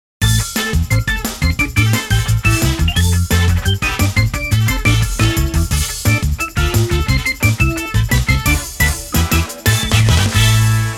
BGM Mature

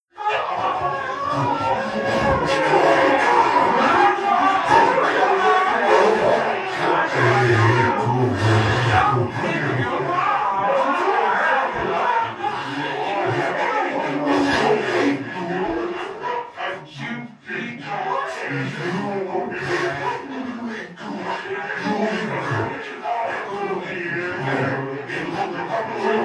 screams of the damned

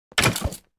skeleton hurt sound; Minecraft

